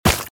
Meaty Deaths

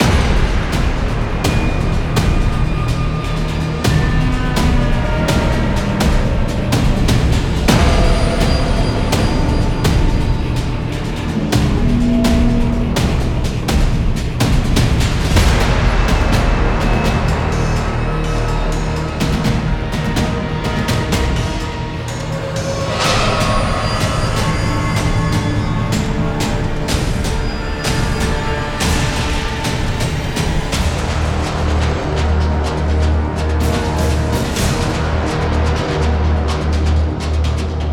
Base Loop low

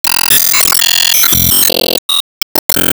Kizuna Complete; disney star smash android ios mobile gamerip 2020

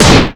Enemy Bigflame Active Shot

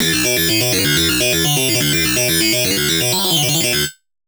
Fill Arp Sharp 250 E; exciplex sample presets pack; JinoBeats

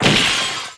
Glassy Common Delete; gamerip; Se Enemy Glassy Common Delete

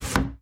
Cupboard Slide In; lethal company

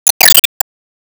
Timebomb Generate; Disney Star Smash; Android, iOS, Mobile

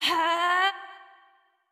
Charge Wind Up; Jackie Charge WindUp

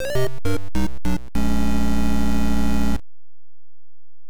No Bonus; Kirby's Adventure; NES

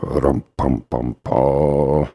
Singin; Laundry; Neighbours From Hell Console Edition; GC, Xbox

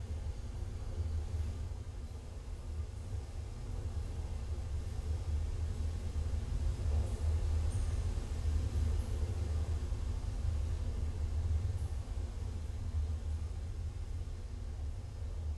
banya ambient fire; PS4; Black Book

